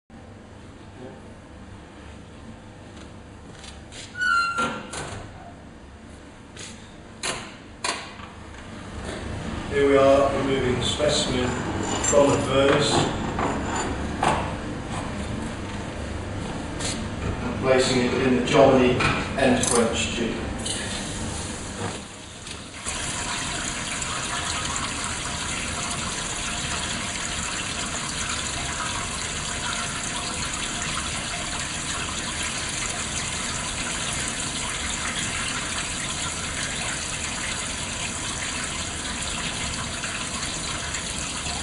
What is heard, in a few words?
jet